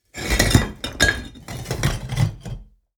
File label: Flipping various block pieces on a pile of rock